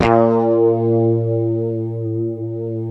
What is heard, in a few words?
B 2 STRAT VB, HYPED STRAT, Guitars; Spectrasonics; Hans Zimmer Guitars VOL-1; 90's Sample CDs